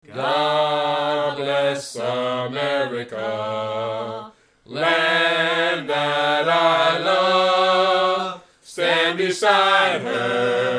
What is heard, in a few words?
acapella